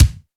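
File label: bass drum snap; RHYTHM WORKS feat R-8mkII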